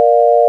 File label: sine; 音の波形と分析